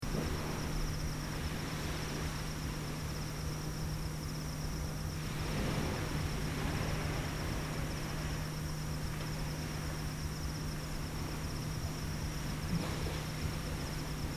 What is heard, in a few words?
Night Ashore; fiji